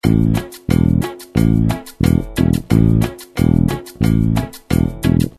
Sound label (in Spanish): Patron bajo cumbia; how to play cumbia bass